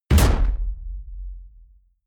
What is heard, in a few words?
sci-fi shot noise weird soft; schusswaffen